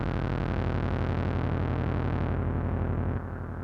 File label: monodelay em 86, synth; instruments; loops